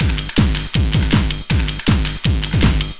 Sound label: LOOP, Eurotech; Sounds Good